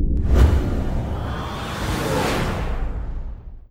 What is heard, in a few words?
hyperspace start; gmod; star wars battlefront 2 galaxy map; hyperspacecollection